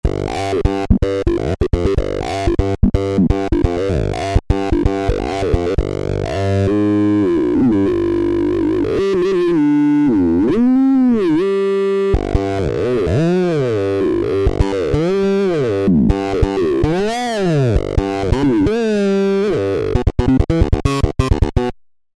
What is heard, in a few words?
3o3 Hero, Roland V-Synth